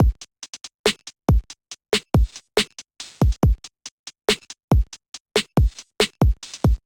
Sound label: Kit 70 bpm, Trap Drums; MPE Tempest Kit; Trap Drums by Sound Oracle; Ableton; Factory Packs